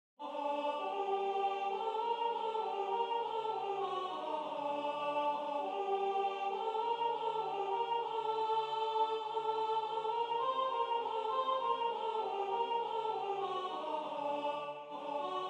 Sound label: soprano